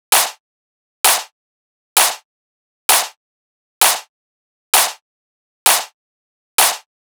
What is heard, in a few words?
Clap Loop 130, Techno; Ghosthack; Free Techno Sounds 2024